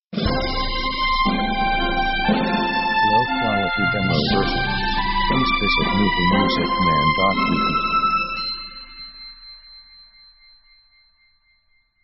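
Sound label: orchestra fanfare; victory themes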